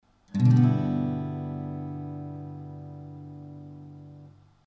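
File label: G6, Acoustic Guitar; The C Chord Shape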